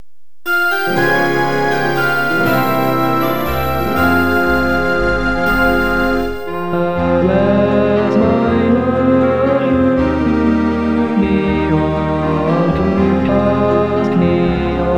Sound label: karaoke